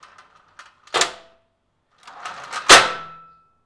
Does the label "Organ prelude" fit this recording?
No